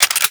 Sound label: Hand Shotgun Reload; Warframe; Weapons; Corpus